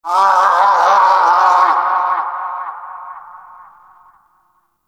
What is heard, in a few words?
Vocal Captured Lift Away; Warframe; Hunting Critters; Vulpaphyla